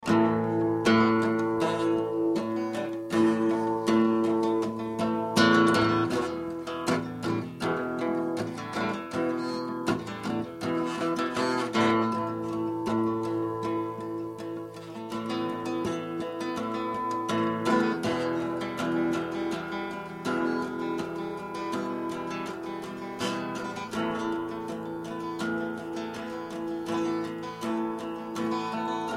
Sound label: acoustic